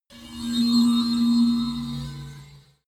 magic warp relocate building short

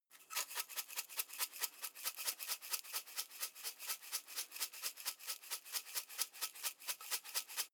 herbs shake dull long; berufe; gastronomie; soundpool

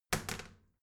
toilet seat lid wall open soft; haus-garten; badezimmer

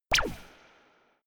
space jumping shoes; bewegung

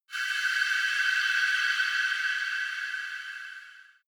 shot shining mysterious lights, swooshes swishes

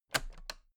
toolbox latches close heavy double; industrie wirtschaft; handwerk

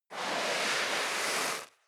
step leather shoes sand slide long; schritte

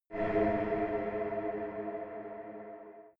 space shuttle signal; raumschiff

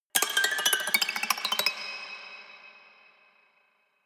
haunted strings weird stroke tinkle hard

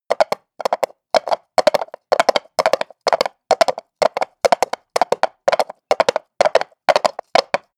horse hooves clack trot asphalt; natur-tiere; pferde

